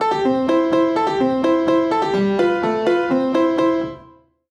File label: Piano Music